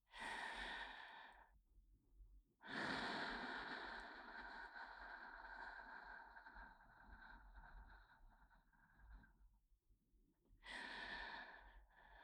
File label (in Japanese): 吐息のみ 左耳